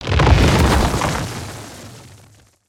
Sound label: man eater spawn; weapons; aronwk collection; ndaudio